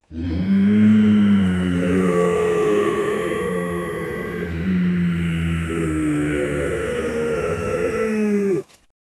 distant zombie horde seve

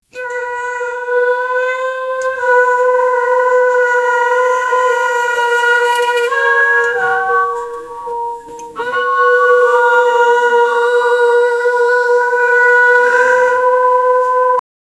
muffled barks, dogs; translator

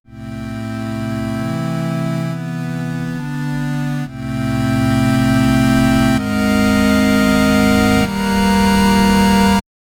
8 bit bgm; apocalyptic

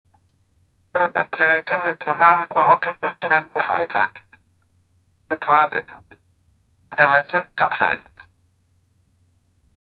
a filipino television

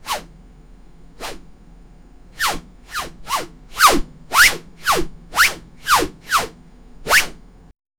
powerful and loud katana

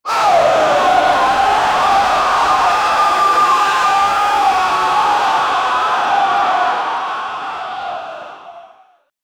scary cries soldiers mass

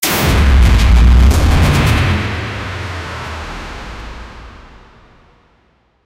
energized dash, power

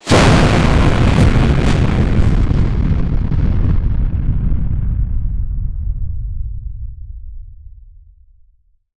charging ball of fire rel, raging

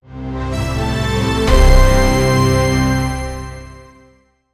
a short and impactful vic, build up

